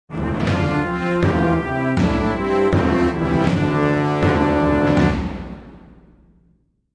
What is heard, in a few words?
Rome Music; Civilization Revolution